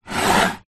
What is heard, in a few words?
spice jar glass small slide on wood shelf